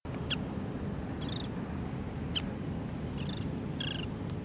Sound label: Oriental Pratincole call; Amar